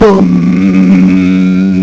bass synth; Amiga Soundtracker Sample Packs

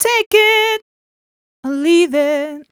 f mel d, fem Vocals

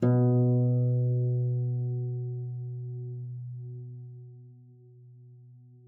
A#2, HARP; Xsample VOL-8; HARP P+MF

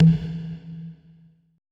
TUNEDRM E3 R; BPC DRUMS

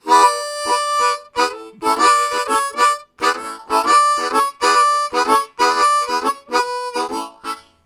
A Shuffle, Harmonica; Zero G

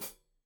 Hat Foot, Hi-Hat, Cymbals Stereo

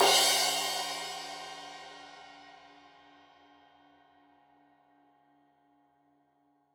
Crash 15in L edge, Cymbals Stereo

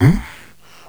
Mmmh; Zero-G; Soundclash Nu Dance Electro; Acidized Wavs; FX Atmospheres

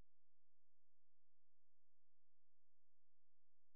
A#, Synclav FM; Big Fish Audio; SPACE1

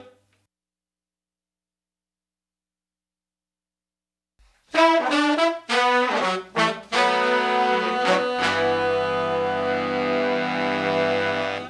Soul Horns, 70s Analog Funk; Sonic Foundry (Sony Creative Software); What it Is!

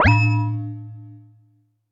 U220 FX Set, Drum Machines; Roland U220

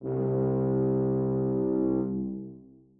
tuba leg C2, Brass; BBC

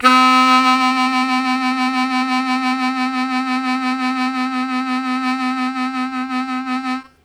Strong Vib Sustain C2, Harmonica; Zero G

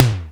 R50e Tom E Lo, Kawai Drums

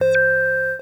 SYNTH Tone; Smackio; Polyend; Legowelt

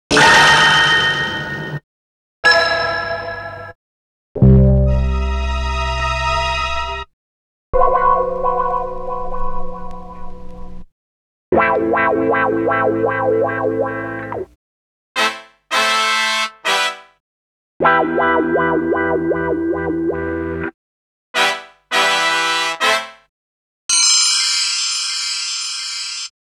breaks, Jungle; Zero-G Jungle Frenzy 2

